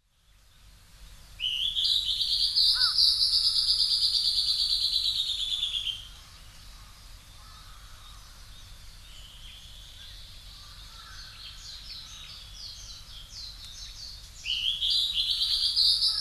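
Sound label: Northern Hawk-Cuckoo; juuichi; hyogo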